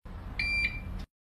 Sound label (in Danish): kredit kort bip